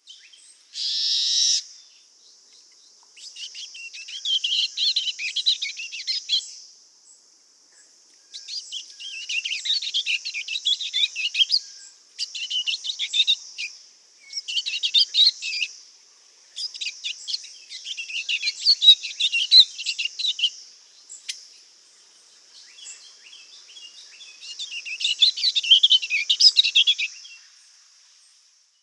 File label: Warbling Vireo; his characteristic call note; Norwottuck Rail Trail, Amherst, Massachusetts